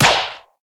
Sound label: End Of Stretch Release Ball